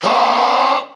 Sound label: huh group of men; ooh ahh; heh huh